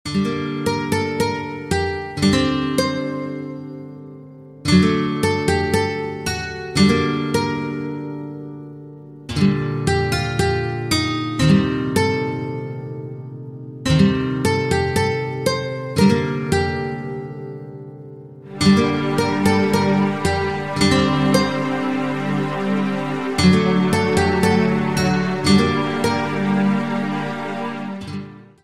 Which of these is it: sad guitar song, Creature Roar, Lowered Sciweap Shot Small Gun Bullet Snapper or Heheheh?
sad guitar song